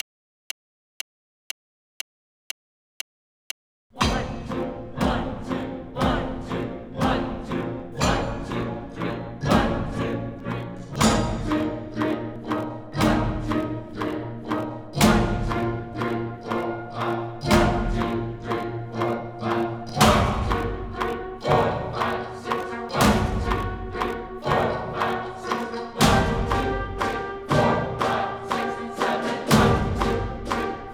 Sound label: musicians plus pulse only